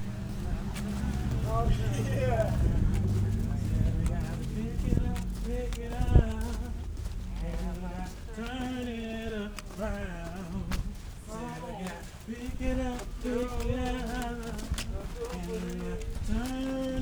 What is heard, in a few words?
singing fellows